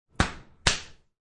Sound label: Double Body Slap, Two slaps to the body; Combat